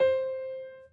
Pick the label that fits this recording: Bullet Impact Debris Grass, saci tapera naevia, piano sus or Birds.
piano sus